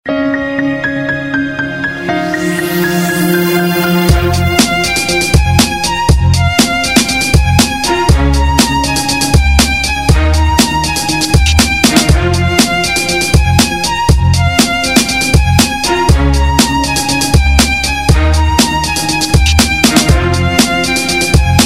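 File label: fortepiano i skripka, fortepiano and violin; Electronica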